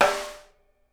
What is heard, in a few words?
SNARE, Live Snares